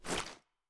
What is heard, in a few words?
ads down, weapons; wfoly ar; viper